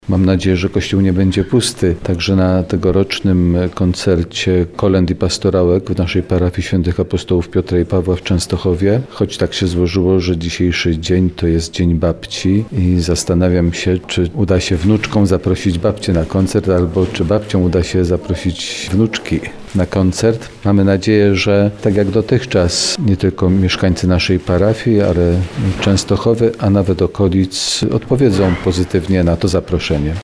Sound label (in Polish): koleda kosciol; kosciol pawla